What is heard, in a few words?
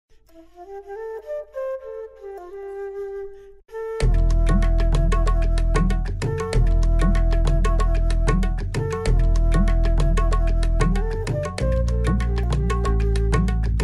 new beat mix